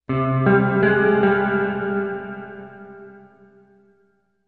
Music Logo Spy Movie, guitar

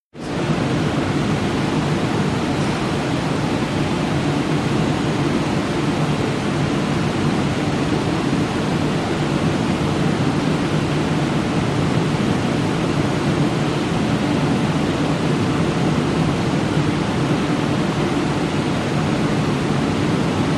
Ferry Engn Drone

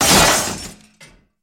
Metal Crash Medium, crashes

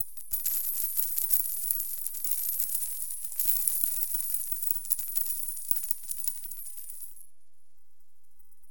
Coins Many Fall On Con

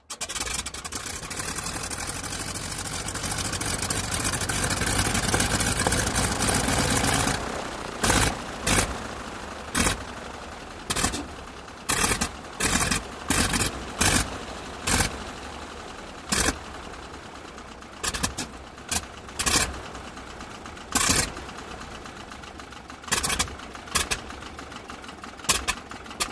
Prop Plane Start Idle, int

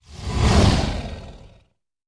Animal Sting Growls; stingers & whooshes

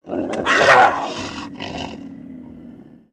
Puma Cougar Growl Lon, big cats cougars

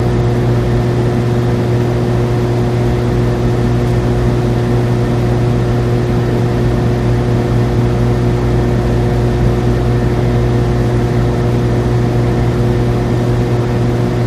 Elect Hum Lots Motor, buzz & hums

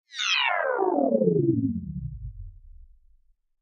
Descend Electronic Ripple Descend

